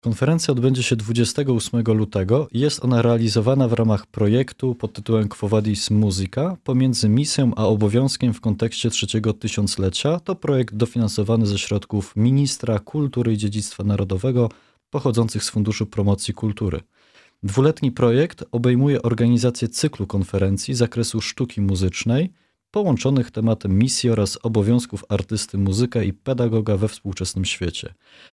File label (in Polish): organy; Wrocławiu; Akademia Muzyczna im. Karola Lipińskiego